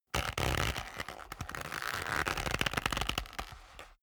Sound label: leather creak long soft